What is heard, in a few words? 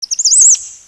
rat alert